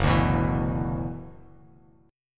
Gb 7th Suspended 4th Sharp 5th Gb Bass Standard, Gb7sus4#5 Chord; strummed; JGuitar